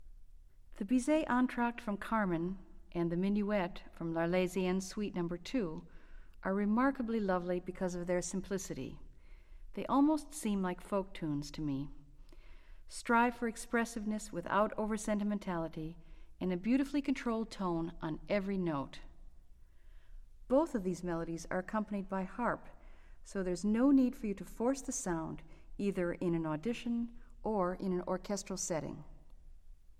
Entr'acte, flute; Carmen; Orchestral Excerpts For Flute

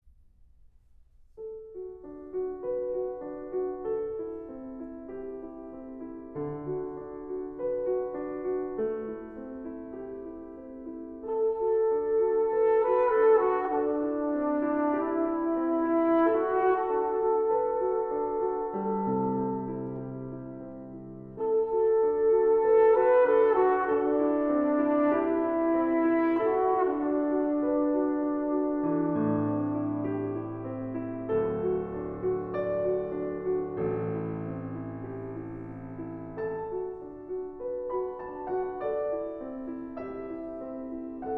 for Trumpet and Piano; 2. Beluga

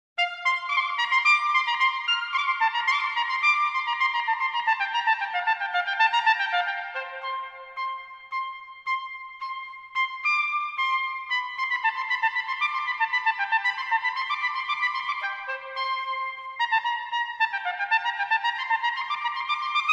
Trumpet Concerto; Brandenburg Concerto #2; Orchestral Excerpts For Trumpet

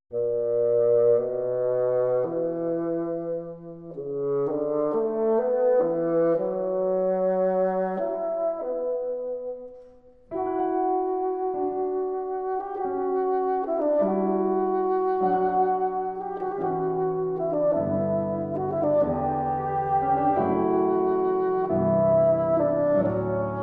Suite pour Basson, Sarabande

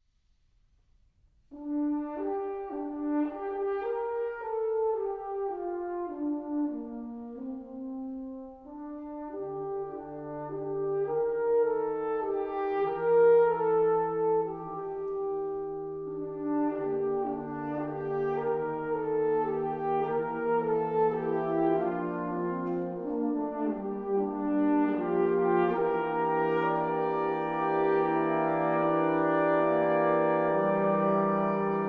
Holiday Horn Carols

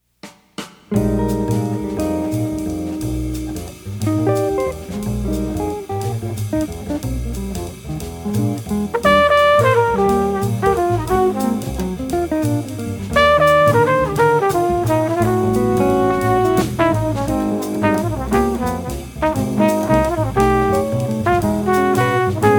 Waltz

